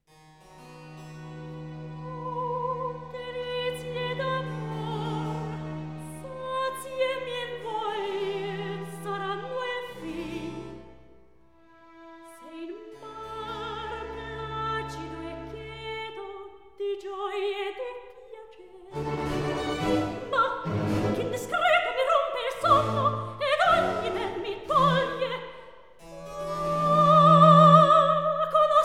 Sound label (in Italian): Accompagnato; Notte placida e cheta HWV 142; Oh delizie d'amor; Handel Masterworks; Klassika